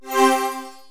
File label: spell